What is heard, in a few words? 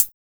Closed Hihat, Cymbals; Closed Hihats